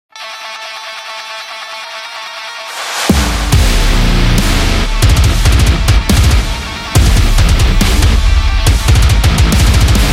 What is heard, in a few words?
From Metal